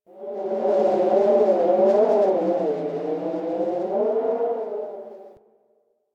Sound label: Landscape Cave Wind Howl, Ambience; Warframe; Venus Open World; One Offs